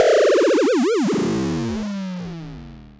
FX, Dual Percussion Synthesiser; Klone

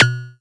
percussion; FutureRetro FR777

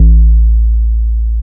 BASS; X-Static Goldmine 1

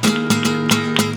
GUIT BREAKS; Best Service; World Colours

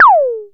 ZAPPY; Back In Time Records; Big Bang

